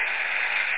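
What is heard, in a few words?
ss engine; lem revenge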